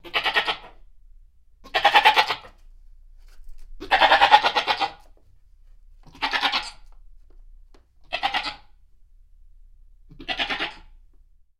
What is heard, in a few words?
Goat Multiple Bleats